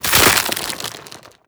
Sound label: zombie move loud, foley; Half Life Model Pack